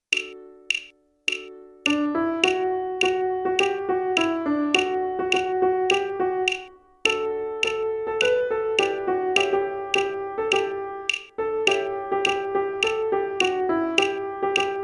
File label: upper instrumental